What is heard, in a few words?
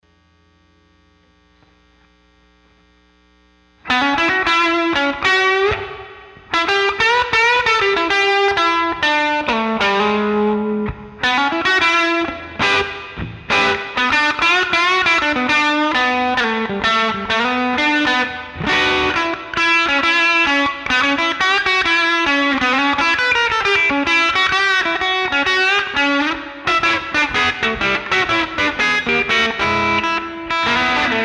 paf p90